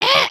headspin, emotes

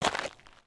Foot Dirt Sneaker Walk, footsteps

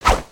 Char Delete Whip

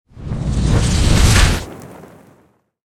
Fire Power Drain; Spinjitsu

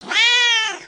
Pet Cat Speak; creatures pets

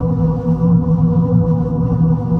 Infected tree, ambience; avant gardens

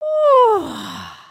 big love, emotes

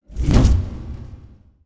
torch by oneshot

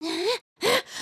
sneeze

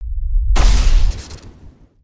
super jump impact

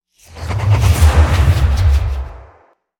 Teleport Disappear; emotes

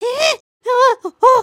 freak out, emotes

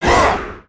Troll Groan

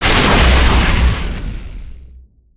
Javelin Impact

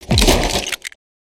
Crates n Boxes, destructibles

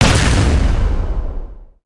pirate cannon fire

